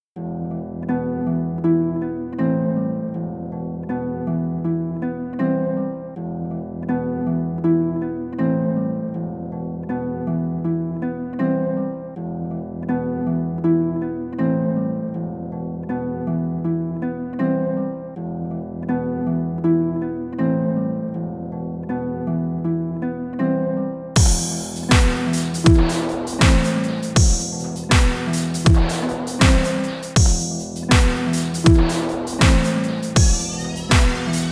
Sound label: Secret Forest (Instrumental)